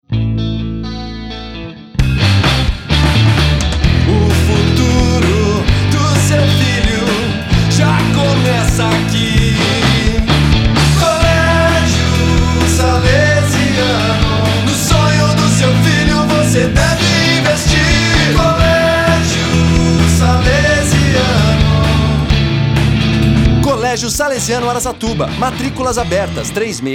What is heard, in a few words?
Jingle; Salesiano